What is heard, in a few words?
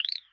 chirp; Ivy